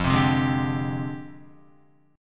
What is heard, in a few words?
F Minor 6th Add 9th, Guitar; JGuitar